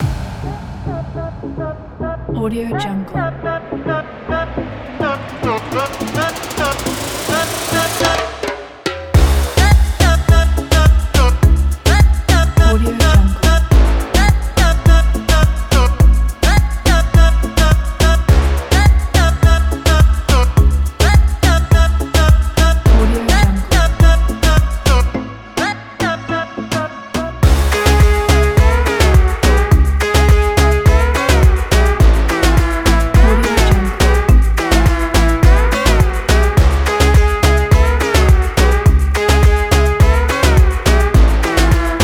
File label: reggaeton